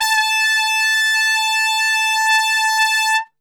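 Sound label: A 3 trumpet straight, brass; Best Service; Brass Super Section; TRP STRGHT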